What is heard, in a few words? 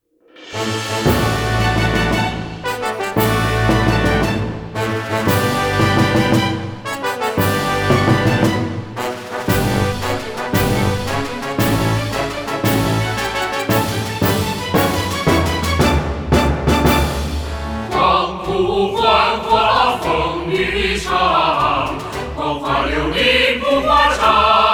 music; swufe